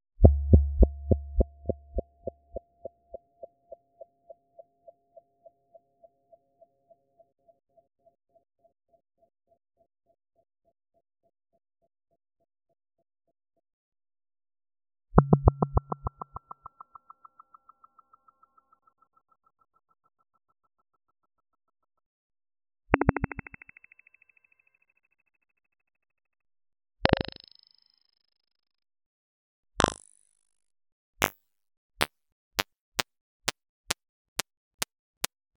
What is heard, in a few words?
Blip Echo At Different Speeds